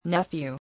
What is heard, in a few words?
nephew